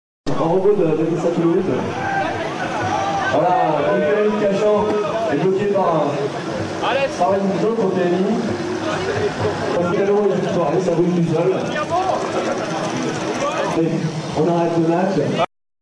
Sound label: Coff